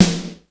SD LO HALL, snare drum; SNAREDRUMS

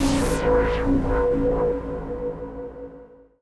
TECHNO 140; Techno2

